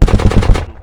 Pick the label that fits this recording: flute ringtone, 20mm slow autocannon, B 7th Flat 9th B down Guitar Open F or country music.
20mm slow autocannon